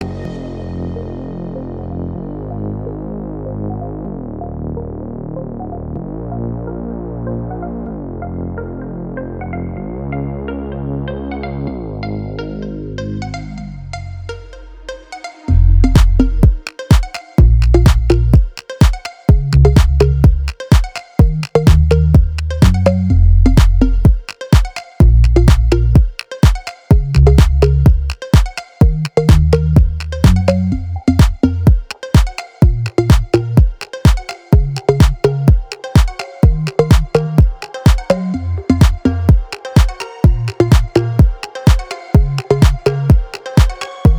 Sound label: Bonfire Future House